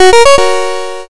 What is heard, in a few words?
win